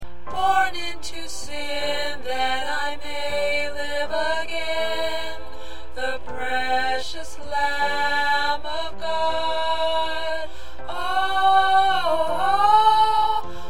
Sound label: Alto